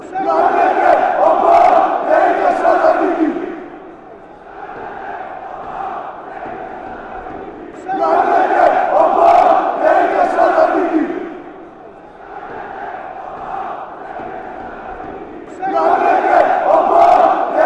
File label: shouts, fanchants; olympiakos